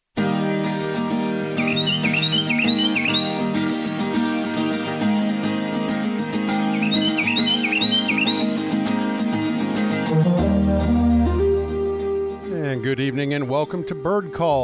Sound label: Bird Calls